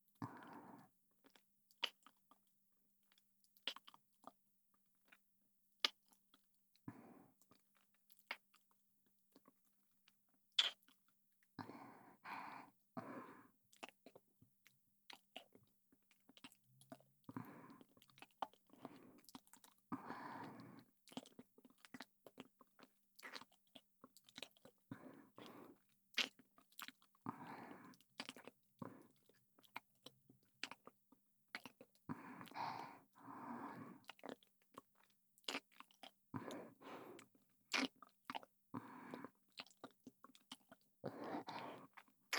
キス; SEあり

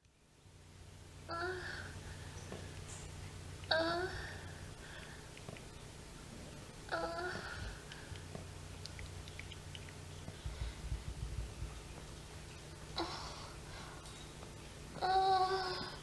ローターでクリトリス刺激して喘ぐ オナニーボイス; ローターでクリトリス刺激して喘ぐまんこちゃんのオナニーボイス

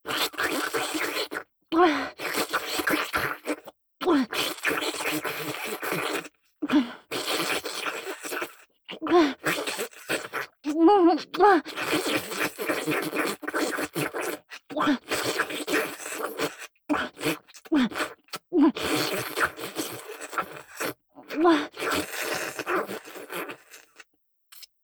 フェラバキューム

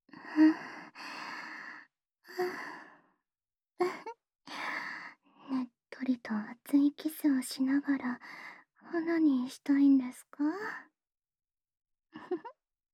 唾液多めのディープキス, ASMR

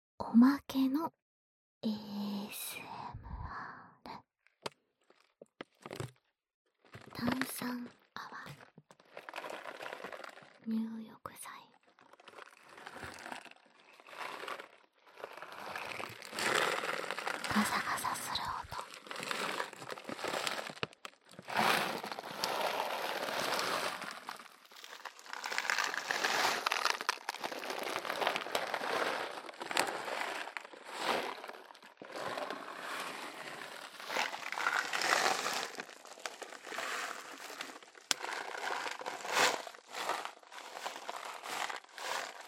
炭酸泡入浴剤

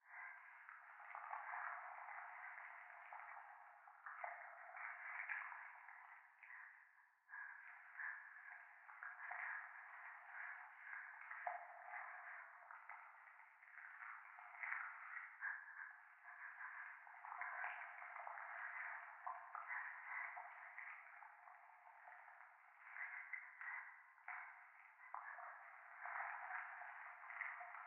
教会 右耳快感吐息 触手

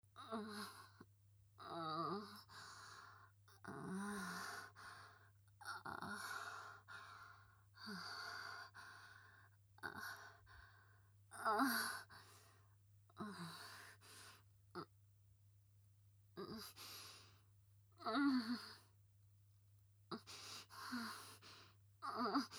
オナニー・喘ぎ; ヘレナ

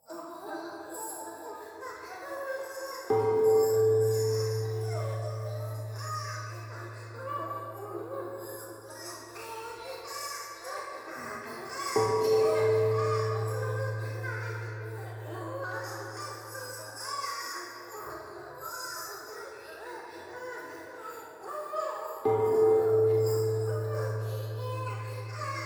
風呂 低音喘ぎ 神社, ASMR

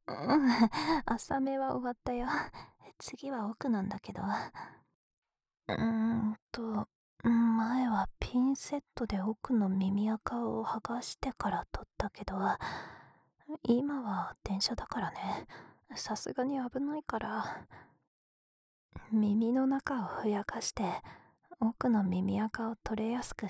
【左】 耳塞ぎ と マッサージ; 耳かき小分けトラック【環境音なし】

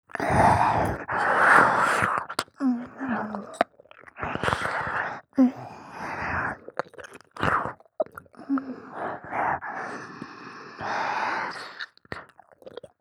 耳舐め （ゆっくり）

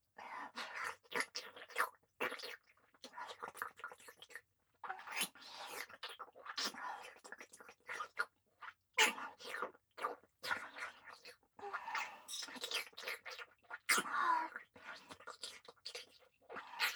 フェラ; レイナ; 姉

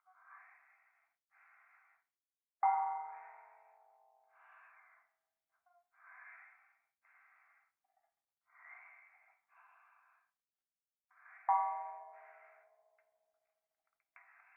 風呂 右耳密着吐息 寺

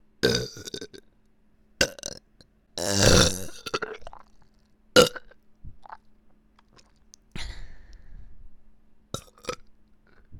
左 げっぷシーンのみ

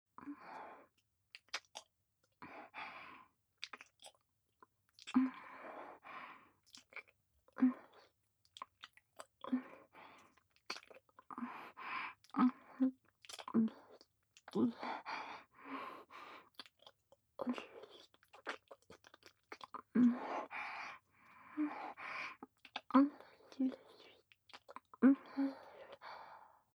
ディープキス_吐息多め

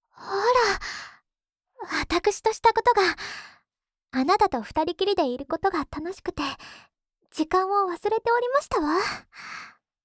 安眠ボイス＆寝息, ASMR; おまけトラック２

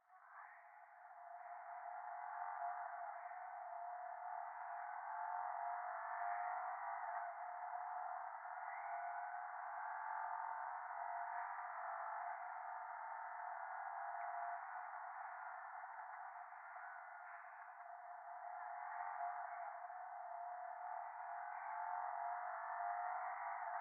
電話 右耳密着吐息 車の中

